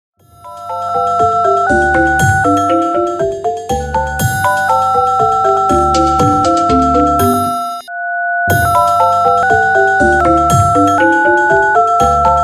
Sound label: Android Clock Alert